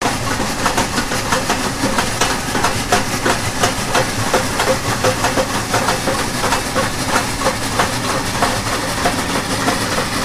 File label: Steam Train Chug Sound